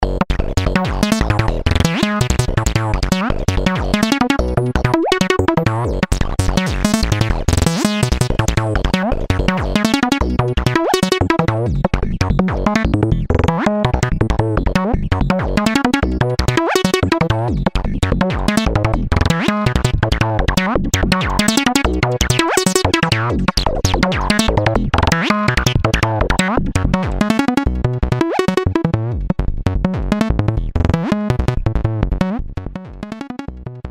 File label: Bass; Rephlex; Robert Elster